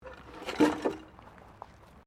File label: JUNK, Debris; Material components for constructing more complex sounds; Materials